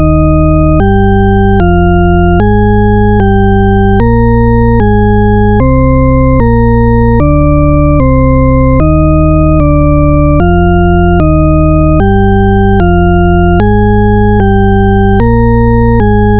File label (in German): Shepard Tonleiter Melodie